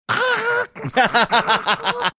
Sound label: making modem handshaking noises